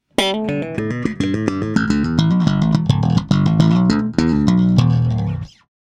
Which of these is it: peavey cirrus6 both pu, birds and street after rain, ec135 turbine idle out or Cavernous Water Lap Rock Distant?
peavey cirrus6 both pu